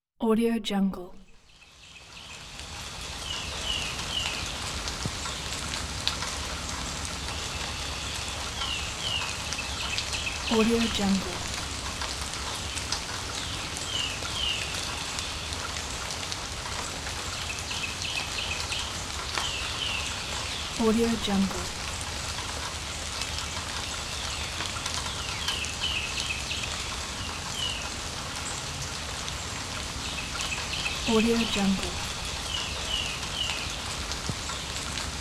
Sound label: soft forest rain